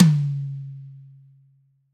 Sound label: drum hitclap